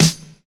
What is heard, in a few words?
crunchy acoustic snare e key